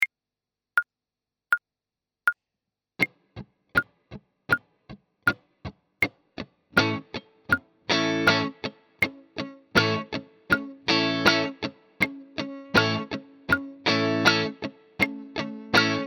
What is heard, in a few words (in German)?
Beispiel 80 BPM